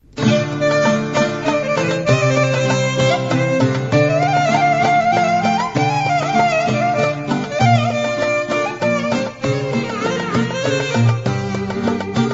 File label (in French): Tsamikos, Grece